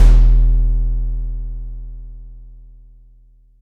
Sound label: long room reverb tom drum sound e key, Royality free tom drum tuned to the E note; tom drum samples